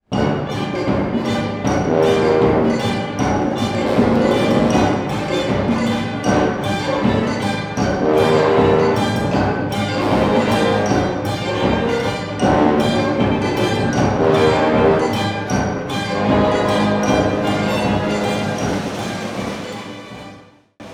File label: Largo; A Bigger Splash